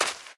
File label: sand run, step; blocks; Minecraft; Remastered